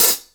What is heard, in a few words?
Hi Hats; Instruments & Percussions; InVision Interactive; Lightware VOL-1